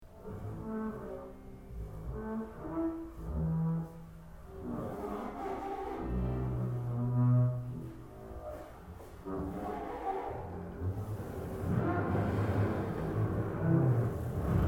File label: String Suite